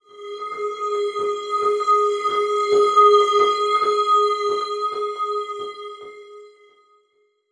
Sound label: Transition Stab Dark Bell; Production Element